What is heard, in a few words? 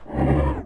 Seal Mutant Breath In; Playable Tutorial Level; TR3